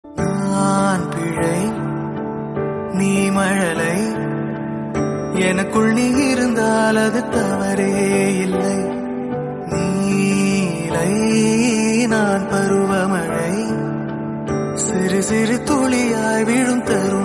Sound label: flute tamil instrumental